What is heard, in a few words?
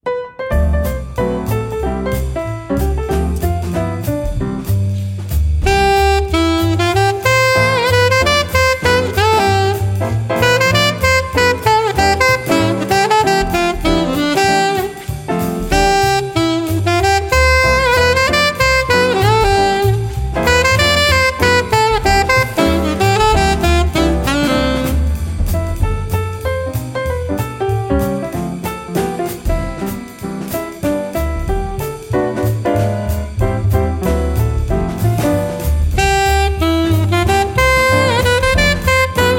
Xmas Jazz Band; London